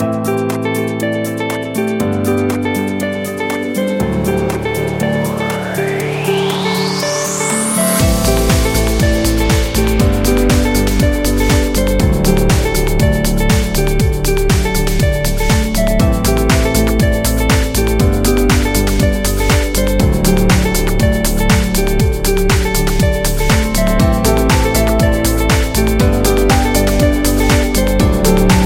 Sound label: An Inspiring Corporate, corporate music; corporate music vol 13